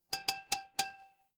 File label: glass glass tap